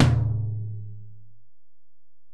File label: Tom, Alesis DM-PRO, Drum Machines, Toms